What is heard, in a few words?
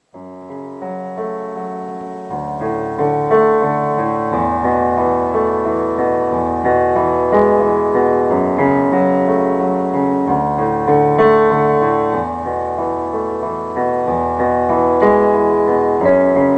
piano cover